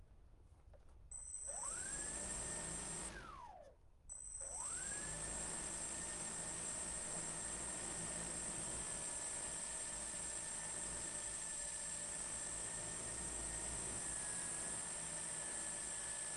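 drone s900 exterior startup steady rpms shutdown stationary; mkh8060; sonniss gdc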